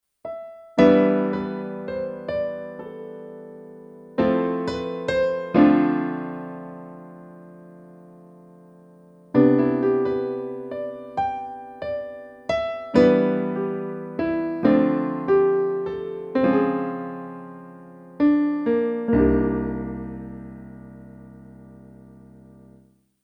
Grand Piano; Roland E X10

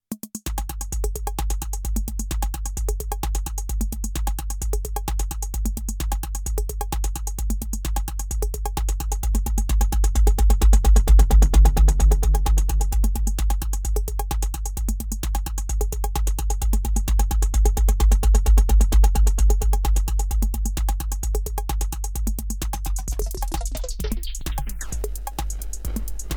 Erica Synths Black Hole DSP2 Dual Delay

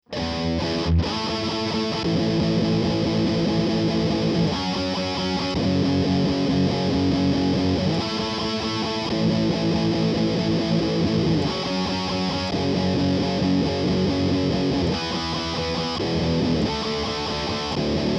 Dist. Power chords oct down

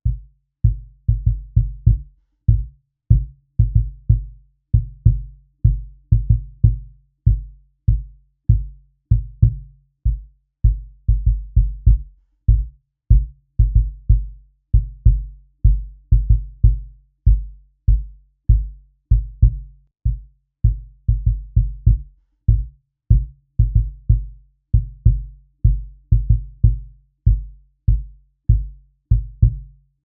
Cajon Kick

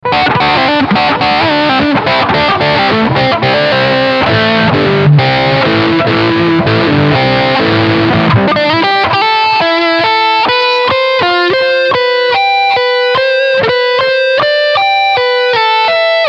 Leadsound Steg HB SC Mode

